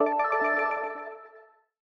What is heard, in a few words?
quiz correct